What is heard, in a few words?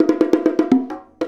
Bongo Fill; Essential Percussion